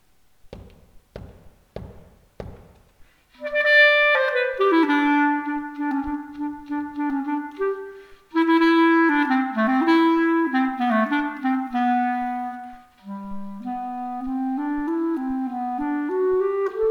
austrian polka, Skilful Duets

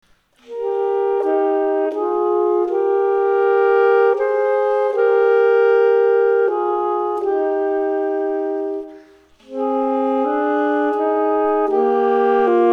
Duet

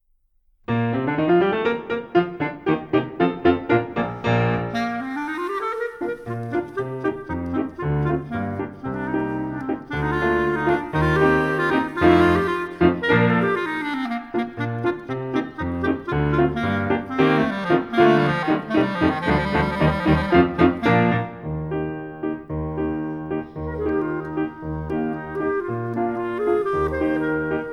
Skilful solo; Skilful Solos

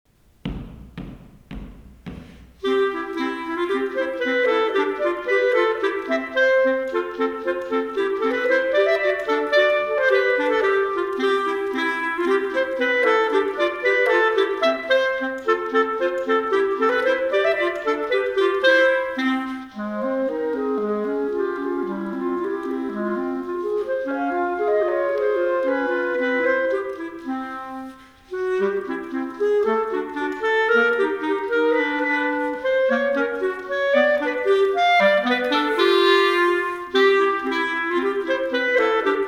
Skilful Duet; Skilful Duets

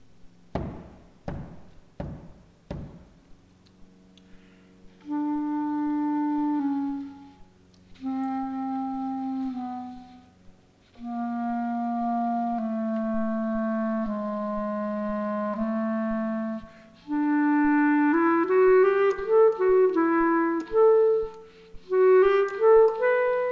classical theme, Skilful Duets

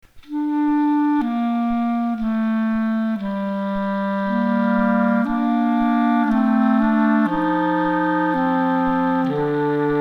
Duet, Skilful Duets

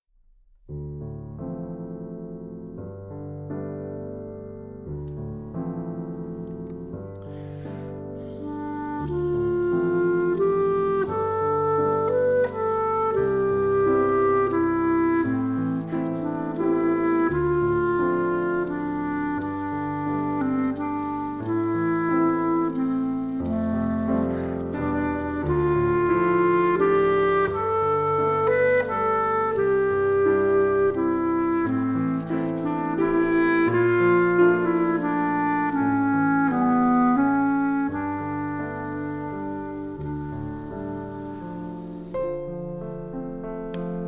Skilful solo; Skilful Solos

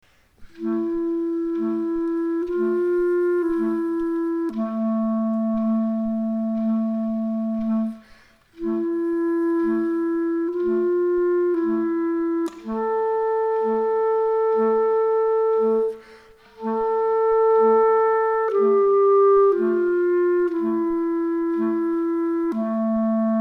Duet, Skilful Duets

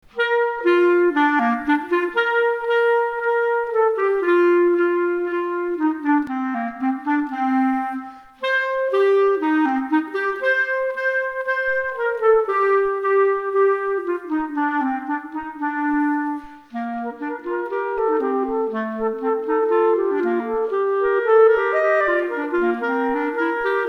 Duet, Skilful Duets

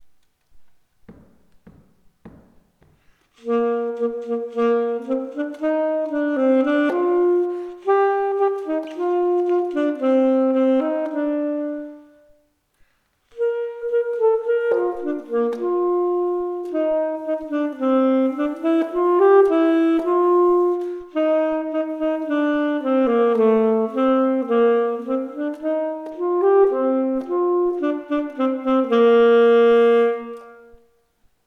norwegian song, Skilful Duets Sax

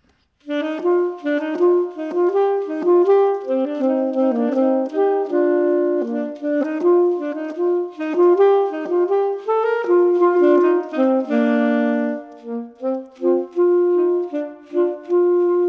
Duet

